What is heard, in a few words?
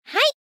Entry Snd; magic maid cafe